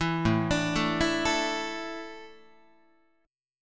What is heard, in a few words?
A Suspended 4th, Guitar